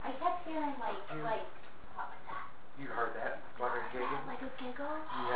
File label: Giggle; St John; evps